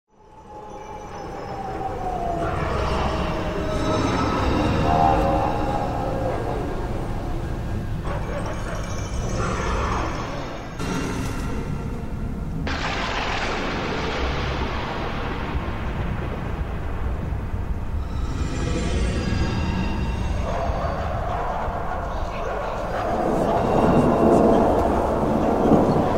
Scared Yet, sonidos terror

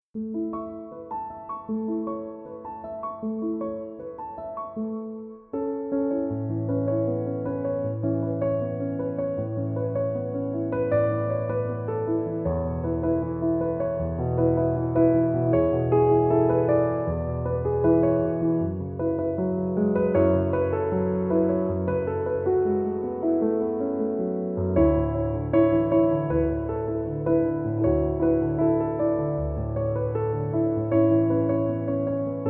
piano instrumental

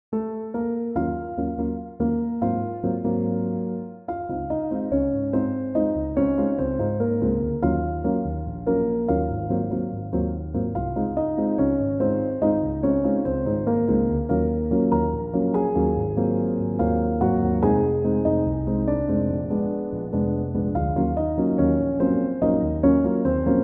chill piano instrumental

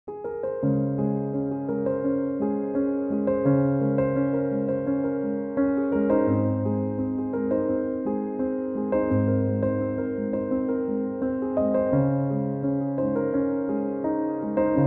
piano instrumental

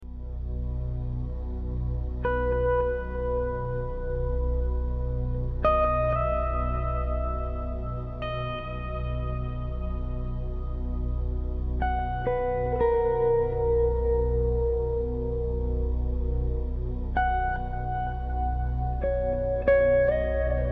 Spa Relaxation, Music For Massage

